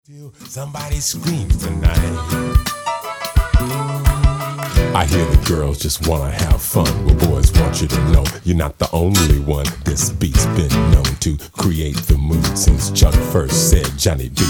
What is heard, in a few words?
rock and roll